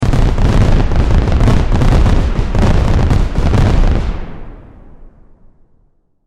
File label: Fireworks Long Burst; Chinese New Year (Sound Effects:Seasonal)